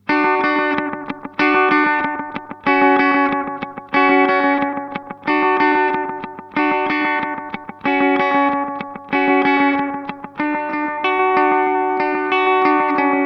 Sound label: Echosex 3 Slapback; Gurus